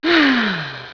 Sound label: Fem ah